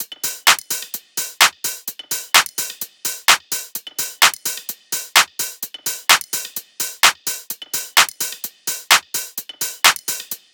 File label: tops 128, Drum Sample Pack Loops Top Loops; SD SEISMIC; Slate